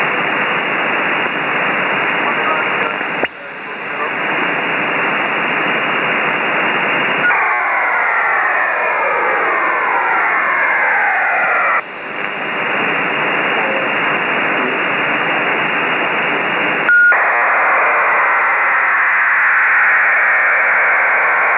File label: mode hfdl acars